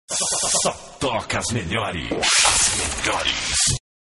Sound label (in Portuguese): vinheta